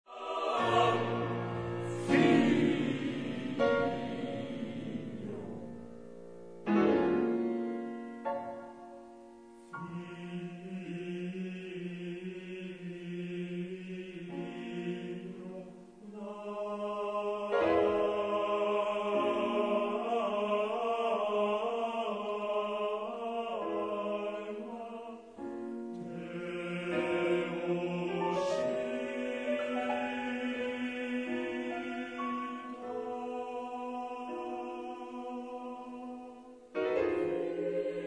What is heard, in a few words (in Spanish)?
Coro; Pianto della madonna; Coro Asturias